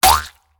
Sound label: World Squash vegetable; Angry Birds Epic